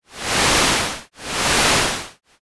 shoreline

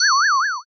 flashback in

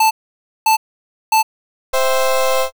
cd horn

